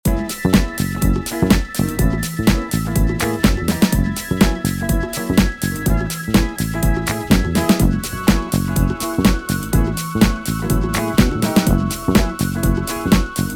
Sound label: Funk Disco